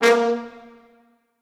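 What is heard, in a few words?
Orchestral Brass, A sharp 2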